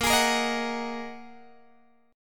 Bb 7th Suspended 4th Sharp 5th Bb down Guitar Open G